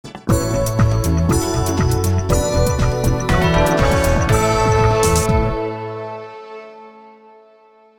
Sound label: Christmas Promo bumper; WLS